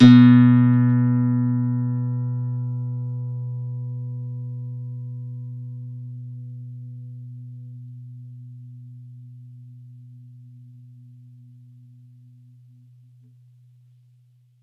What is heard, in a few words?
B2, Campfire Nylon guitar; Worra's Campfire Nylon